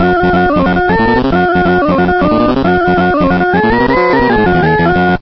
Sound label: intermission, pacman